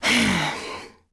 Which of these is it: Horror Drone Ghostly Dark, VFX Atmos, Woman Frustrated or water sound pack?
Woman Frustrated